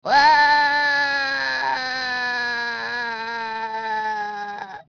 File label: death fall; Oddworld; Munch